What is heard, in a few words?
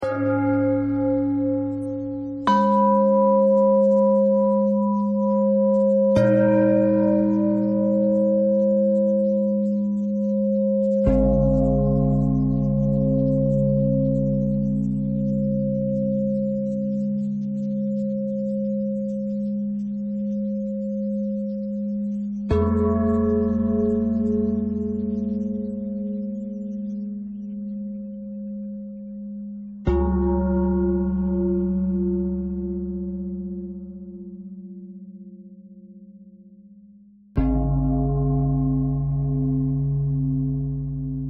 bell thread pentatonic